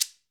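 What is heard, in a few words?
GHANA shaker, African Perc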